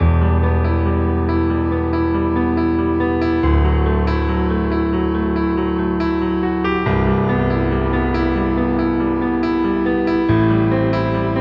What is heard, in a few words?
140 BPM Main Piano Em; Leads; Ghosthack; Hamburg